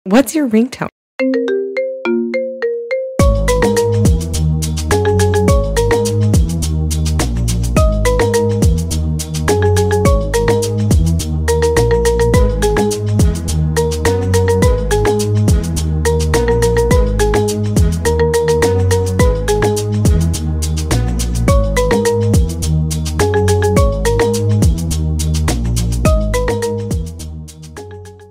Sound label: Marimba Remix